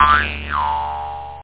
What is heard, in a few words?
jawharp; Master Clips Email Animator